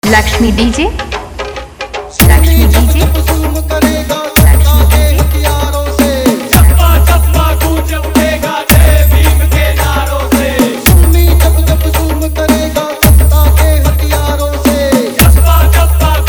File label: Electro Dance Mix